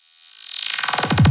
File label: kick brrrrr in 204bpm